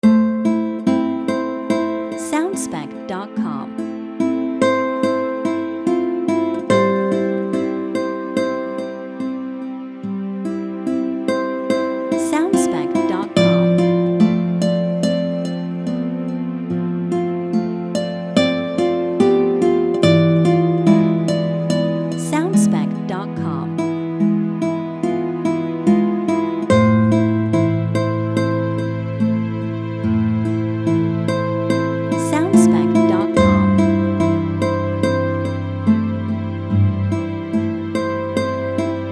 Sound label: Guitar In Love; SoundSpect Music